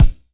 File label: Punch Beefy, Kicks; Airwindows